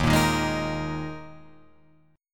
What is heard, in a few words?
D sharp Suspended 4th, Guitar, Open F; 3,5,3,3,6,5; strummed